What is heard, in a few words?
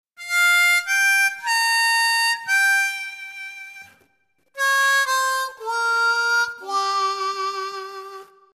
Overblow, harmonica